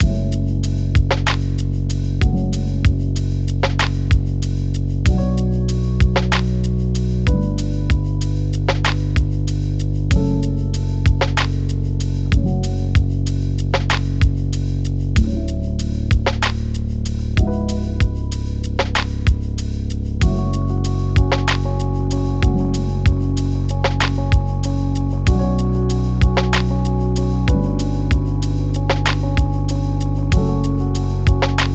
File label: Lo-Fi